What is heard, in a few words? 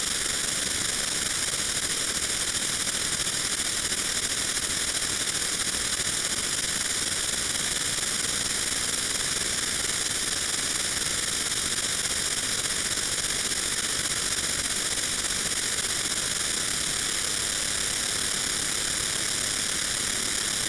Rain Post Patch